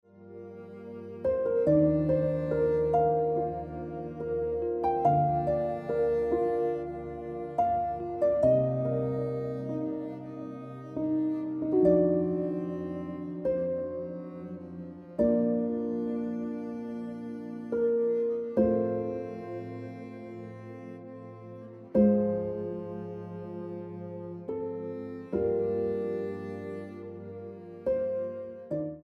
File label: Jade Ringtone Piano Music